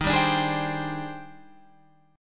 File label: D sharp Major 13th D sharp Guitar Open F, chord; D#M13; strummed